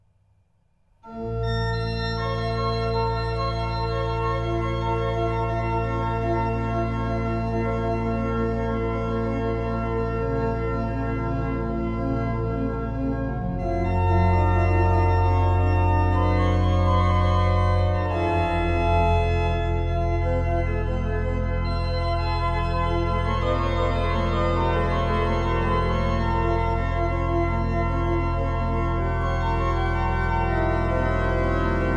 Praeludium et Fuga, Organ; Organ Music from Bohemia; Ariadne Musica Praeludium et Fuga XV (J. C. F. Fischer)

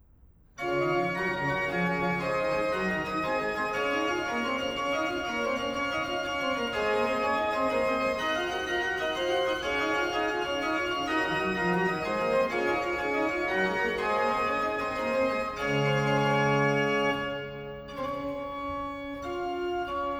Verse 5 Organ; 'Tallis'

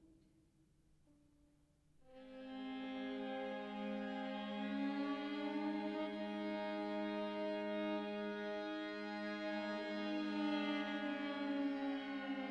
Slide piece 1 (C MINOR)

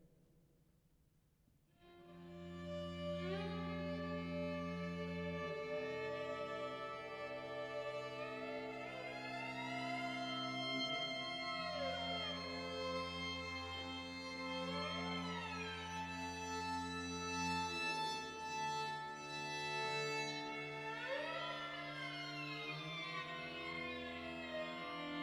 Slide piece 2 (D MINOR)

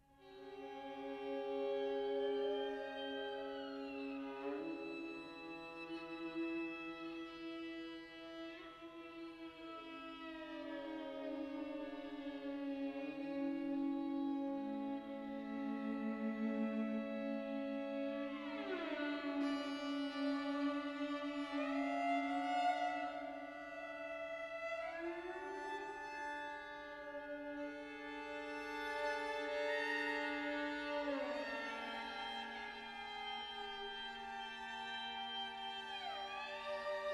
Slide Piece 5 (G MINOR)

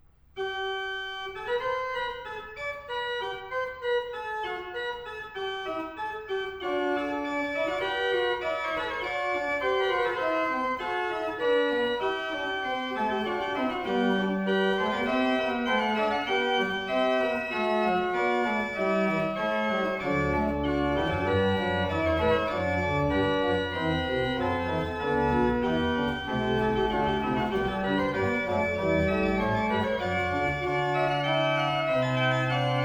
Organ Concerto; Allegro Fugue

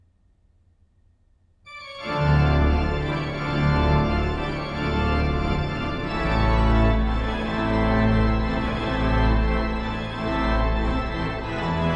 Victorian Sonata, Organ Improvisation; Toccata

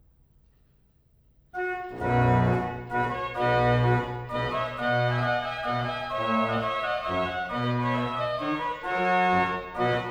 Trumpet Tune, Organ; 'Purcell'

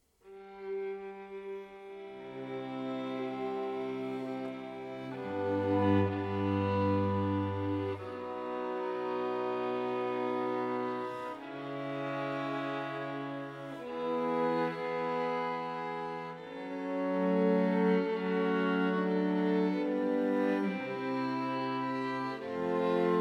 String Quartet; Prophetiae Sibillarum, String Quartet No.2

